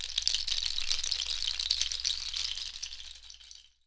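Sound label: POD SHA, AFRICAN SHAKER; Voice Crystal - Steve Reid Definitive Percussion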